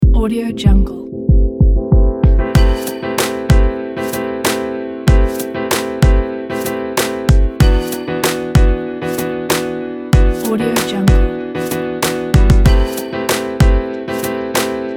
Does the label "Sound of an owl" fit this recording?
No